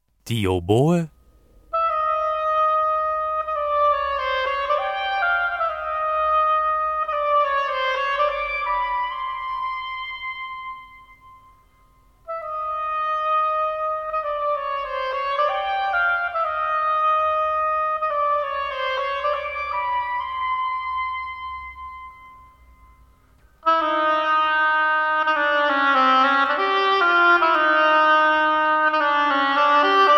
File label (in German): Oboe